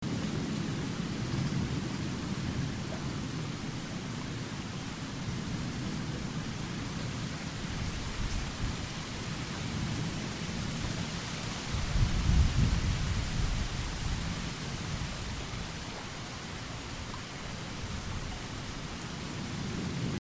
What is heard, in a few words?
Rain Forest; 1944 Battle Of The Bulge